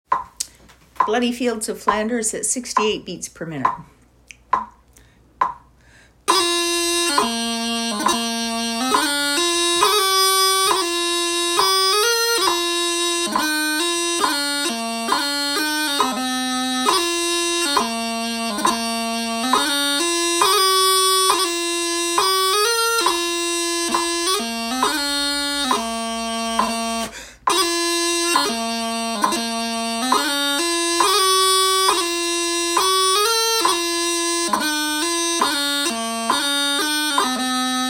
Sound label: 68 BPM, pipers